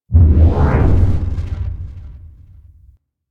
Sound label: UI Starchart Exit; DE; Warframe; Starchart Redux